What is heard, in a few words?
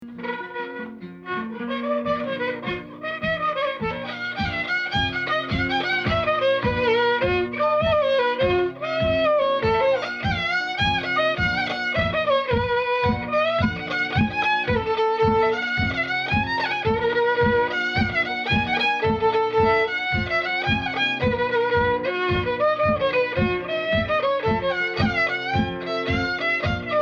Bb Jig